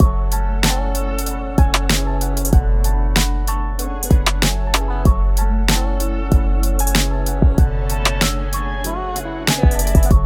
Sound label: F minor 95bpm